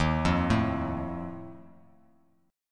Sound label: E flat Suspended 2nd Flat 5th, Bass Standard; arpeggiated; chord; x,6,3,2; JGuitar